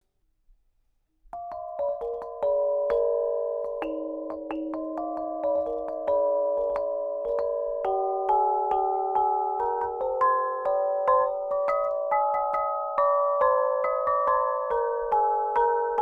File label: kong thom only